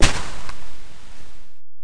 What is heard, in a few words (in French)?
pas chro sable; WehikulCzasu; Time Machine; Bruitages